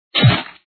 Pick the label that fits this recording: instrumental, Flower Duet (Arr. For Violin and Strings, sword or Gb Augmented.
sword